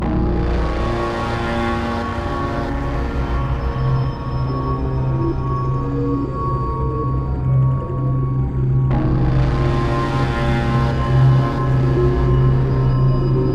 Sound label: Fear Loop